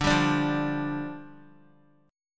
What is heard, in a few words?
D Minor Double Flat 5th, down, Guitar; Dmbb5; strummed; JGuitar